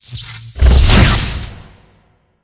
machine attack, monster

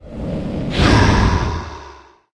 mummy mage attack, monster

